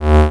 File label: ROCK